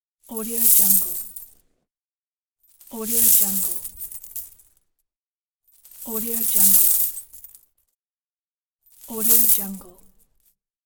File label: coins spilling